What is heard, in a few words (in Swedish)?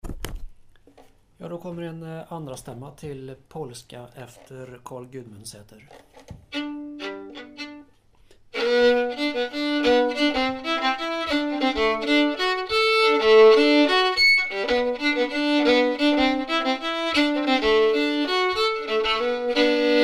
Polska, Dalarna, 2a-stamma; Polska efter Karl Gudmundsater, Transtrand